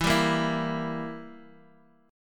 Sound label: E Diminished E, Guitar; Edim strummed; JGuitar